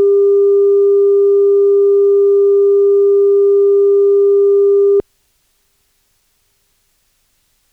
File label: 4dB 315Hz